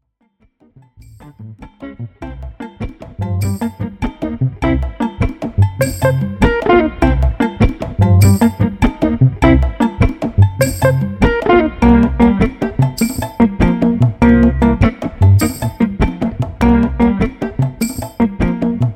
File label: Afro Cuban Snippet